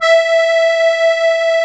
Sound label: HOHNER, akkordeon